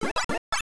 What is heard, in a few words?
pressed enter; Mrs PacMan